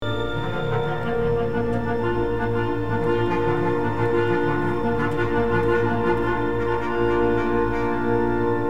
Fanfare Motif Trumpet Awakening Calls; 1st movement; symphony1

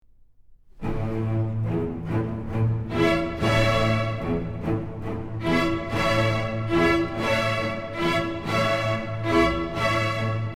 Opening Bass Motif; 2nd movement; symphony1

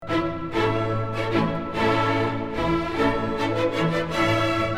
Landler Main Theme; 2nd movement; symphony1

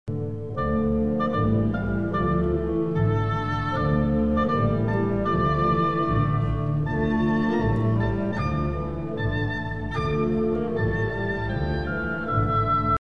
Counter Melody Oboe; symphony1

